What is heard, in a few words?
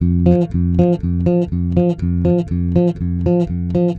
110 Pop Basic Bass F, E-Bass; Pop Rock